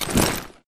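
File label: grunt movement foley loud medium; Titanfall 2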